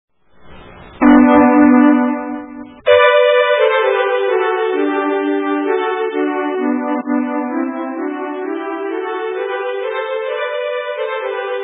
Gaangtrall

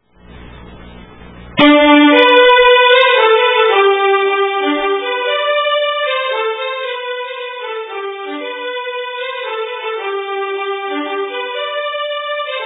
polskan

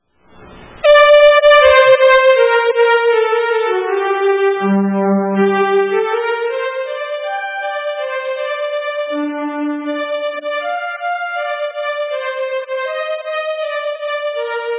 polska

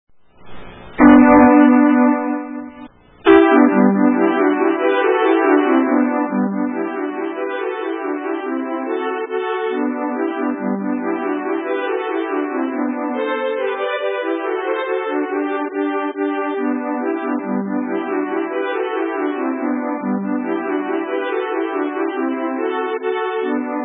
Engelska

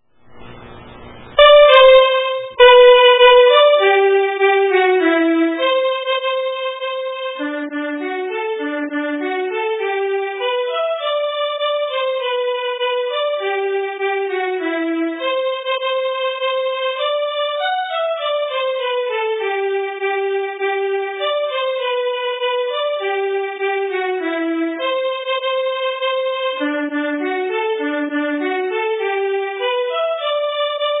Schottis

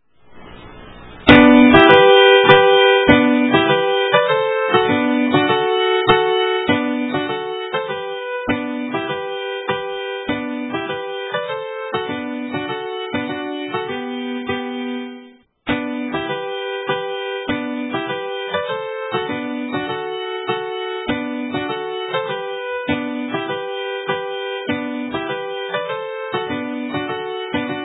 Mazurka

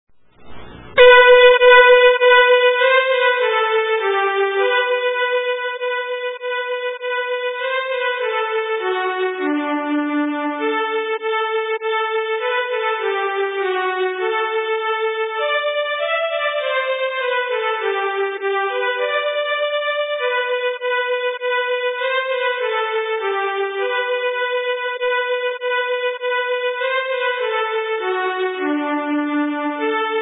snoa

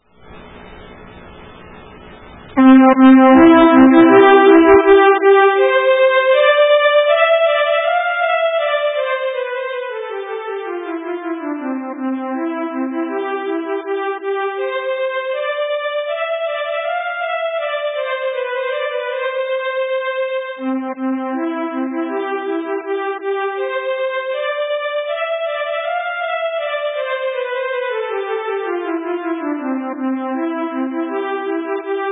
Polska

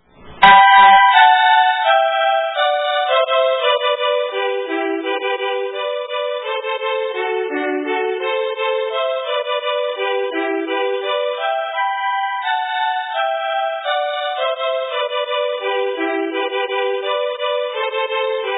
Polka

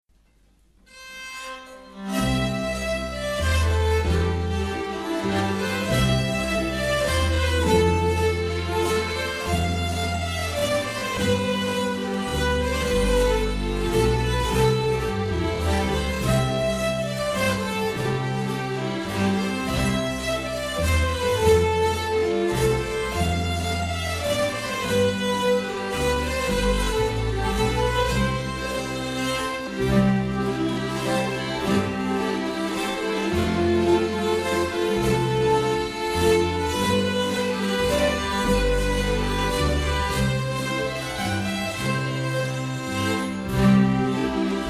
polska C 105 bpm

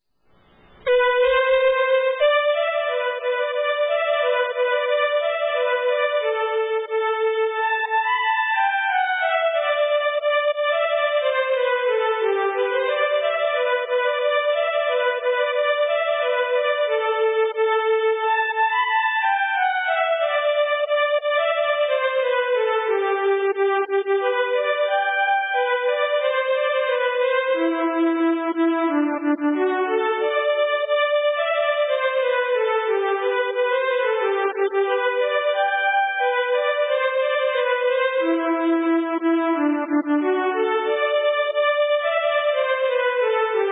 polkan; Ryrapolkan

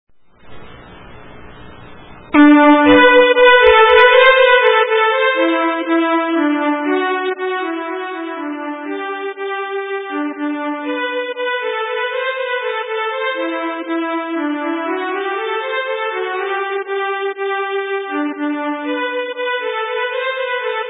Schottis; paa sofflocket

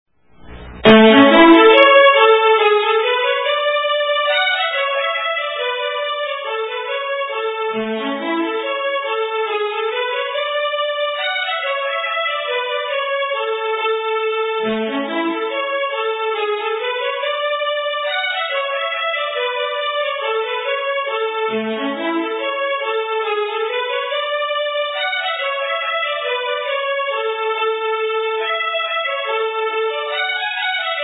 Schottis; Ivar Hallberg

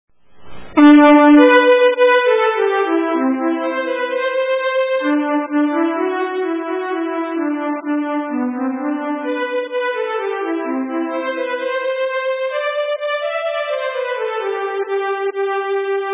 Schottis; Sme-Emils

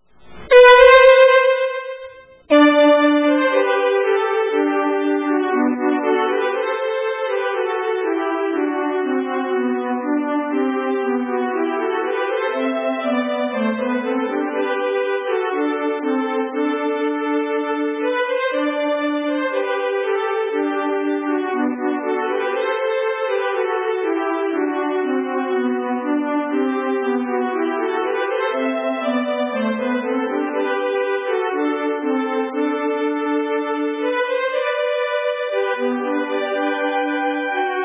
Brudmarsch

